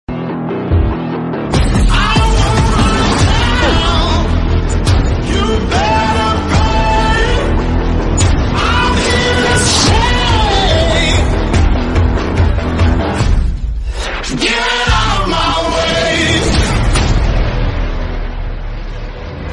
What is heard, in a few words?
Best Theme Ringtone